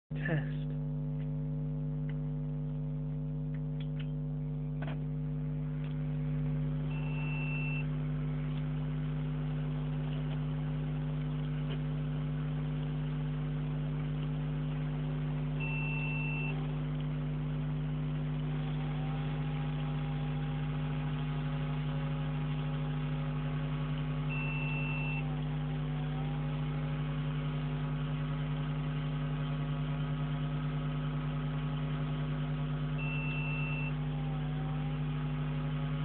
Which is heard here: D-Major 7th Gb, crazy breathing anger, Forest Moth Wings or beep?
beep